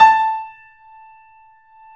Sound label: A, 3-D Audio Collection, 3D Pianos, Yamaha C7; E-MU Producer Series Vol. 5